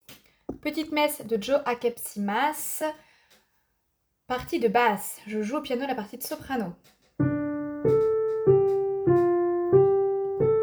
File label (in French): Petite messe kyrie basse; Akepsimas